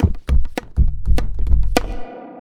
tabla, 100 bpm; Pure Tabla; Zero-G

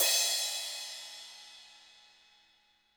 HiHat & Crash, Roland TD-20; HiHats

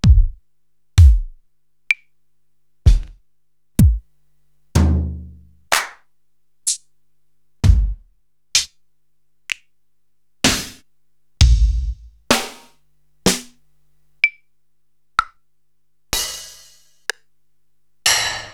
Korg TR-Rack, Samples Sliced; m8

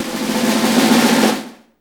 SNARE ROLL; InVision Interactive; Lightware VOL-1

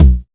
909 Tom; Goldbaby Tape808 & Tape909; The Tape 909

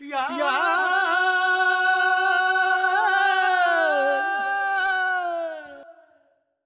Bhangra, Vocals Male, World Pop; Sonic Foundry (Sony Creative Software)

